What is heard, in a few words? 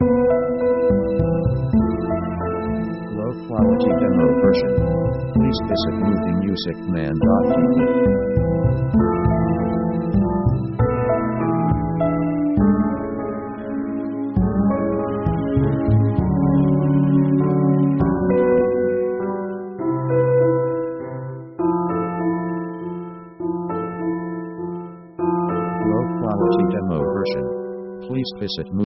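orchestral 70s barcarole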